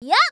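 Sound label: MON ATTACK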